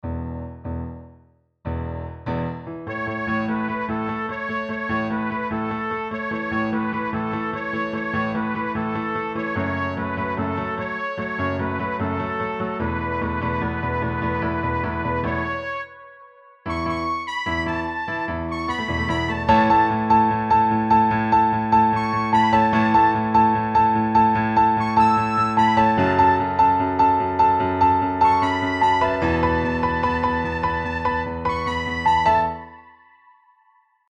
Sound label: piano melody